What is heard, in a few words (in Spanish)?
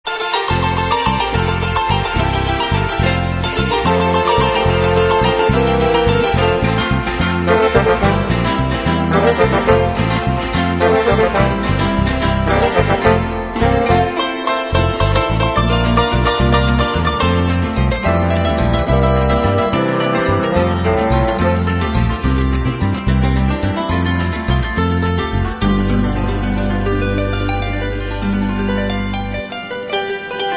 arpa